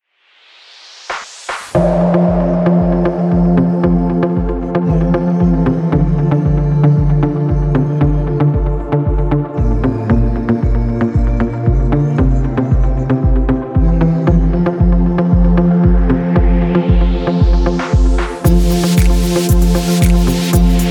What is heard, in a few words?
Instrumental Mix, 130